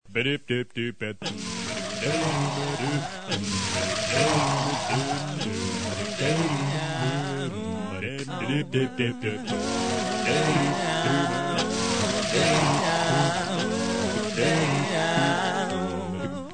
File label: The Puke Song